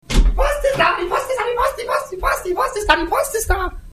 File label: sms